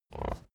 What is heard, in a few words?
pig grunt short